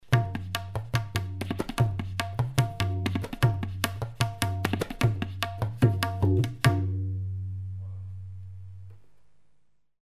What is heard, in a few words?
Tabla solo